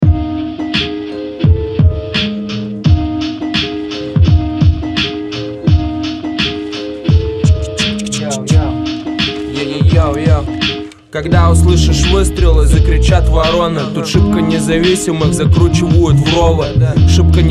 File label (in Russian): organy; organy opeki